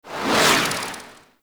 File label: Grenade Throw Whoosh, Infested; Warframe; Zealoid Prelate